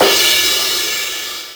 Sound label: Crash, Roland MV8800; K-100 R KIT